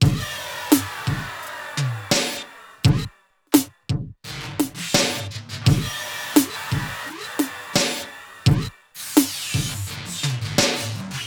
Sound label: Breakbeat, Drums & Percussion